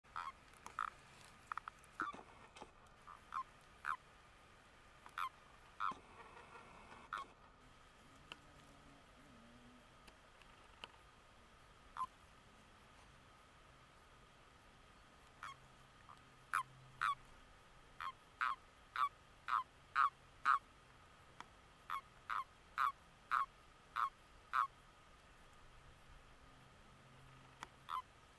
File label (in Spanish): sapo comun